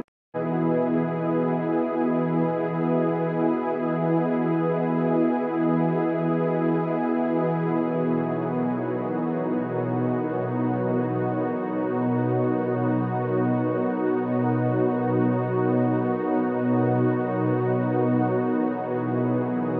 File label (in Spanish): onda binaural